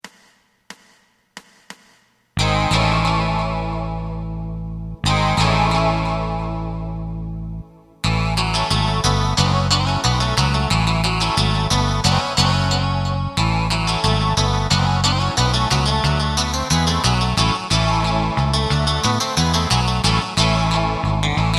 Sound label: drum